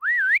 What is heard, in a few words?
Human Female Whistle